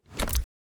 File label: ui submenu close